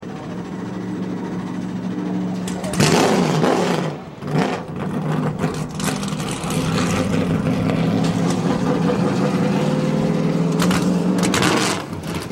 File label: Nascar Ambiance